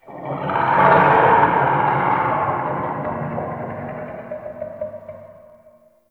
Root Creak Large, Root Cave; Ambience; Neathuns Root Cave; One Offs

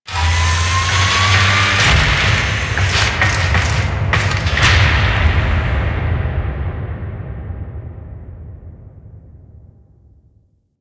Ship Relay Intro Undocking; Grineer Ship Liset; Tenno; Vehicles Flight

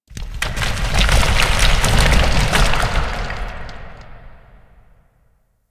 Rock Movement, Asteroid; Ambience; Warframe; Grineer Asteroid

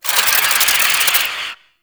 Reload; Warframe; Akarius Prime

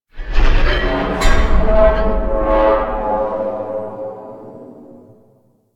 Metal Scrapes And Hits, Asteroid; Ambience; Warframe; Grineer Asteroid

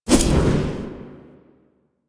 Sticky Bomb Throw; Grineer; Veilbreaker; Vor

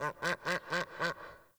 Forest Open Glade Animal Far, Day

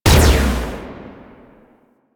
Spear Gun Shot; Weapons; Warframe; Tenno; Hoplite

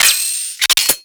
Sniper Rifle Charge Up; Grineer

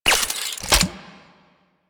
Rocket Launcher Reload; Warframe; UT Weapons

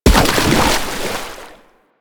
Nechro Mech Step Surface Water; Step Surfaces

